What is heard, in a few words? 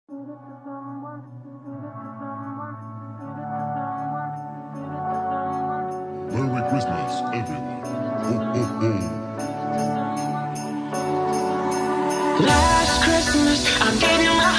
trap mix; junior street long sutton